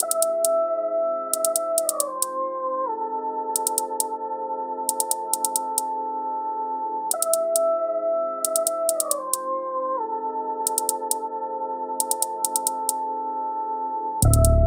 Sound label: Hip Hop 135BPM Dmaj